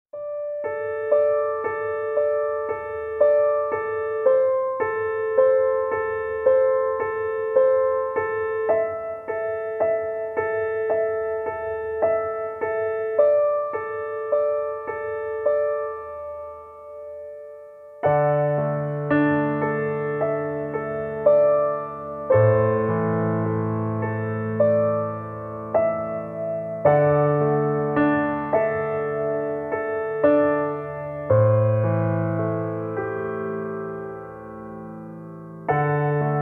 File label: Piano Cello; Red; Out West Orchestra